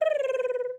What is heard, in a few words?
kiwi indifferent